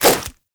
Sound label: nunchuck end whoosh; Warframe; Infested; weapons